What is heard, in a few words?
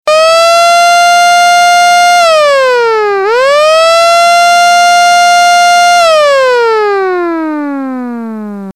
Siren; ORION